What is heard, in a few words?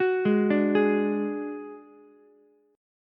D Major Flat 5th D Ukulele, chord; arpeggiated; JGuitar